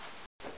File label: jump; tea